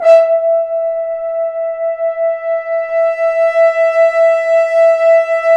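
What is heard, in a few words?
BRS French Horns sfz; Roland LCDP06 Brass Sections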